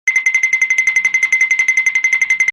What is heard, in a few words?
duck spinning sounds